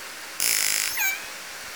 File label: Cowboy Call